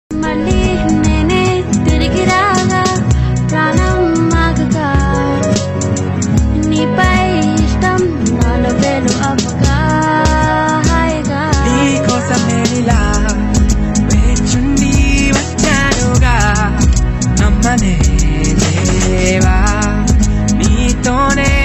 love ringtone, telugu; bollywood ringtones

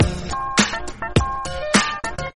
highfunk ringtone; english ringtones

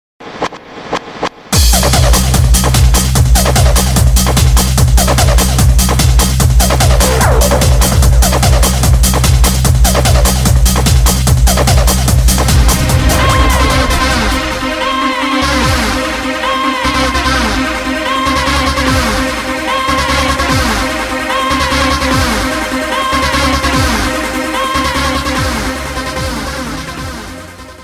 bass ringtone

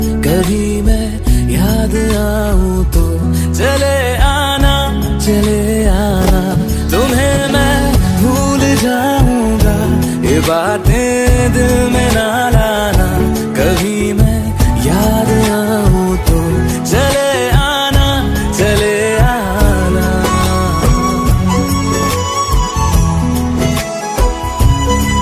bollywood song ringtone

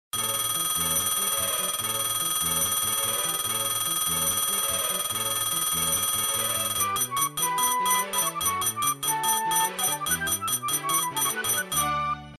nokia 3120 ringtone; lily

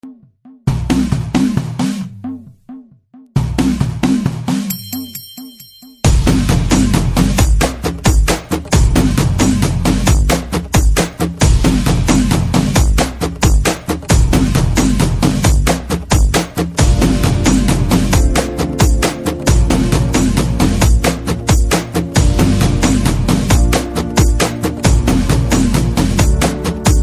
karaoke ringtone

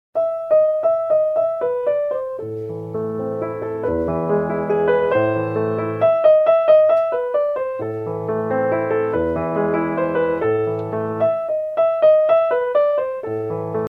piano instrumental ringtone

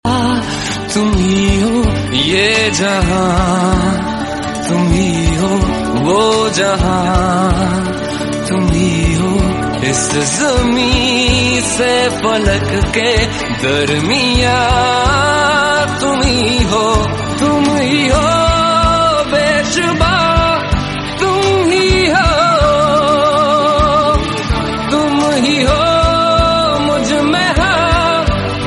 bollywood song tone ringtone

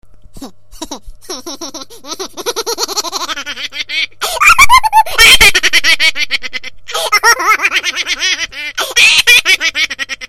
funny jiggle laugh background music tone

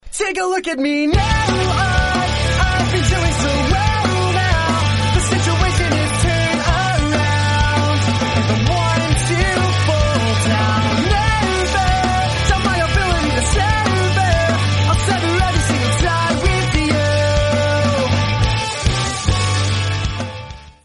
games music tone ringtone

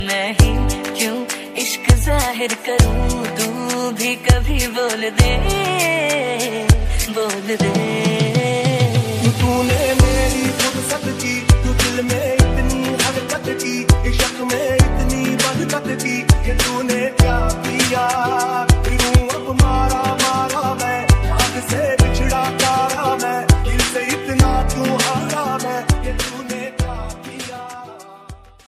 music ringtone

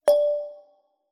crater sms ringtone

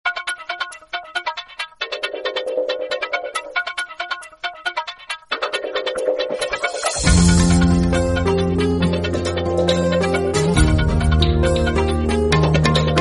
fast music instrumental tone ringtone

